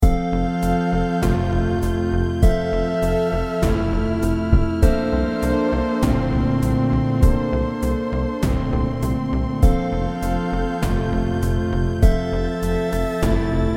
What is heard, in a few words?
Drums N All; Oddity2